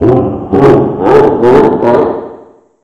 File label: villain laugh; Pokemon Reborn E19